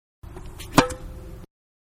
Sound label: Tennis Serve hard, Hardcourt; Tennis (Sound Effects:Sports)